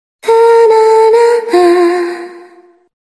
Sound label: hum